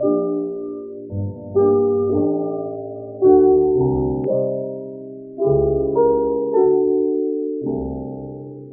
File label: piano resentment, Sad